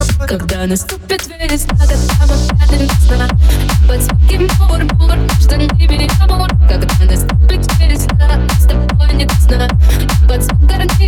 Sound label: techno remix